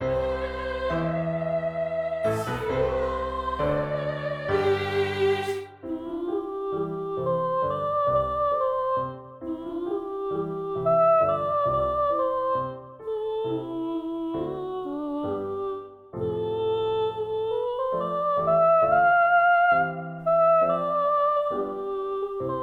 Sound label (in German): Lied; Der freie Mann; prima redazione del Lied WoO 117